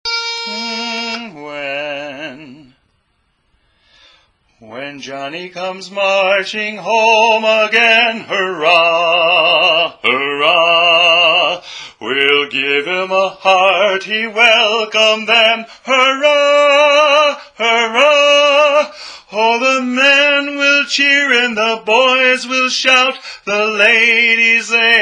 When Johnny Comes Marching Lead; for god & country music

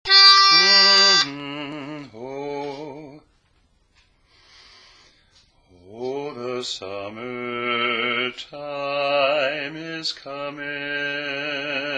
Will Ye Go Lassie (Lead); learning tracks

